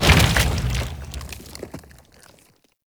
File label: Purification Machine Buried Spawn; Warframe; Nineteeen Ninety Nine; Infested Survival